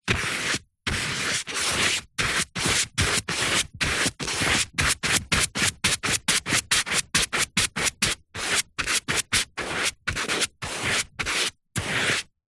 office paint brush artists brush strokes on paper various